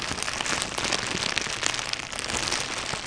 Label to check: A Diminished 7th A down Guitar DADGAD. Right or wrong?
Wrong